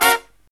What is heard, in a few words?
HI CHORD, Brass; USB Soundscan vol.29 - Killer Brass Riffs; AKAI; HIGH CHDS